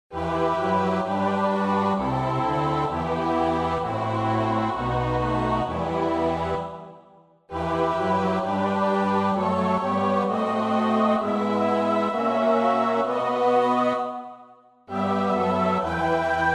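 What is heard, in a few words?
soprano alto tenor bass; Bach (Johann Sebastiaan); BWV 064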